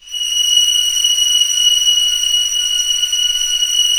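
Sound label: STR C0 FF, strings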